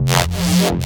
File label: Reese Bass F; Antidote Advent